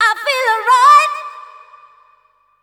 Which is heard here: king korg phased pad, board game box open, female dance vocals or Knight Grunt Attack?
female dance vocals